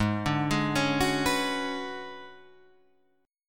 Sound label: B Minor 6th Add 9th G sharp